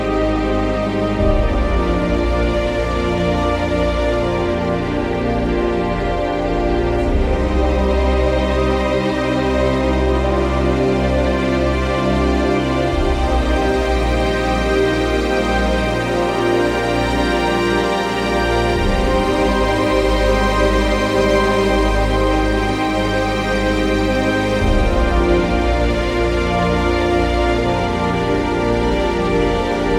Unfolding Tragedy; MIUI12

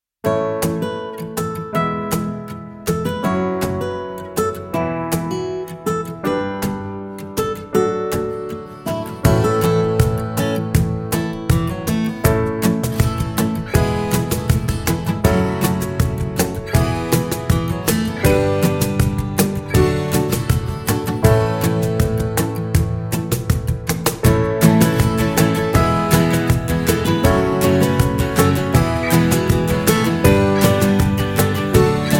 (Instrumental); MIUI12

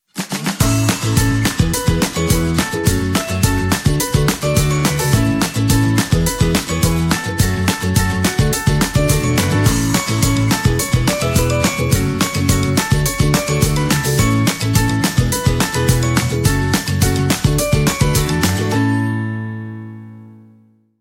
Happy Fun; MIUI12

